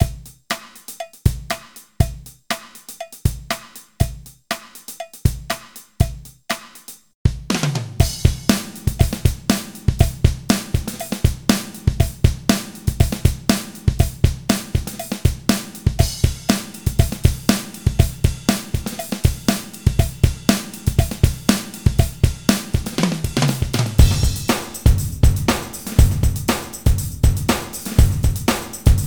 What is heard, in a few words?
Ultra Acoustic Kit, Drum Loops